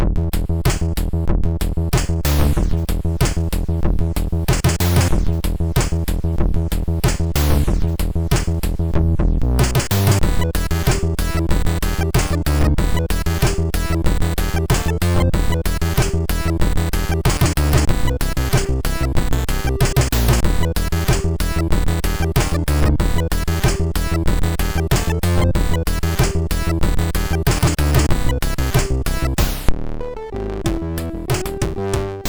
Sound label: glitch, MOS6581R2